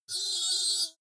metal lid screech high

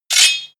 sword knife pull out

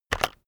keyboard external enter press fast heavy

